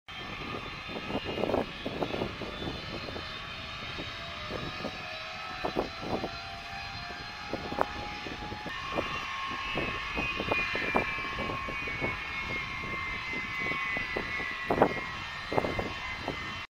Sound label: Ilyushin Il 62